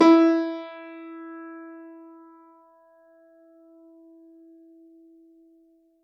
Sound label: Grand Piano, Kawai, Sustains, E3; Chordophones; Legacy